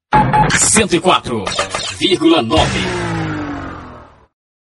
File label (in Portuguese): vinheta